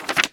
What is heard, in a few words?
page flip